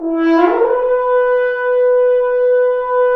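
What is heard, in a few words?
french horns, swells; Roland L-CD702; F.Horns FX+; FHns Swoops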